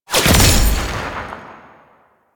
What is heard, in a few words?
Kill Notifier; gameplay; Warframe; Nineteen Ninety Nine; Quincy